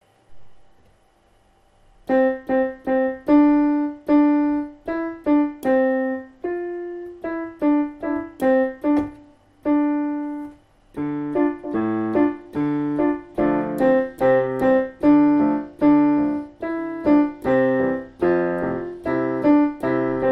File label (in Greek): kalanta xristougennon samos piano